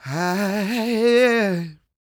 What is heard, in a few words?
CROON, Vocal Planet Blues & Soul; CW SOUL